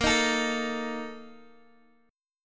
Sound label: A sharp Major 7th Suspended 4th, Guitar Open D